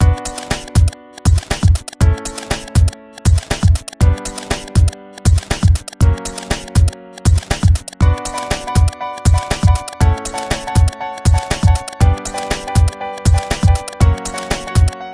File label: beat